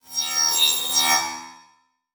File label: Multimedia Internet CD-Rom Flash Electronic